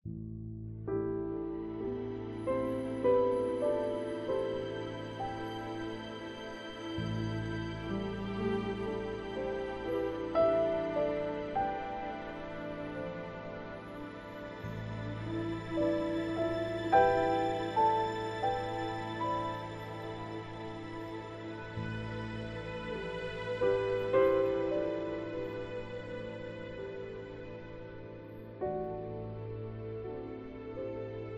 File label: Strings; Stage 04 Second Promenade